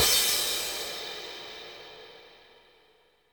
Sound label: Cymbals crash; Vengeance Samples